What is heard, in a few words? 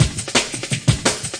170 ghost breaks; 161 to 180 bpm